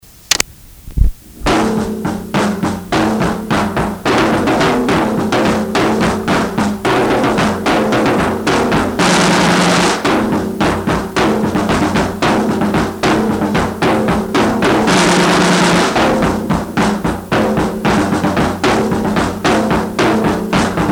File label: drum solo